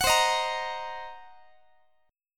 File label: C Minor 7th Flat 5th Guitar, chord; Cm7b5; strummed